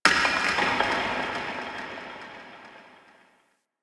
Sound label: Interior Cave Rock Smash; Warframe; Infested Eris; Misc Indoor